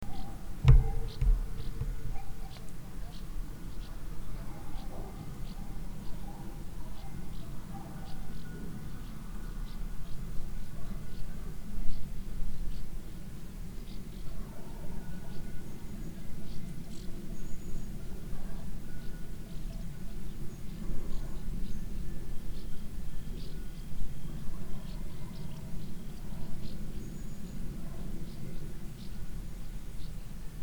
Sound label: valley evening; guarda